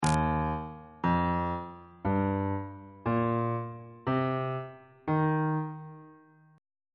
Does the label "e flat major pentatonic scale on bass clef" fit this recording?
Yes